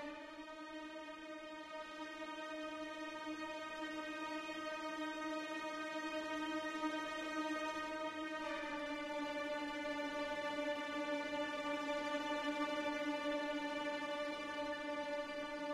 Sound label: strings tone, tones; Secret Agent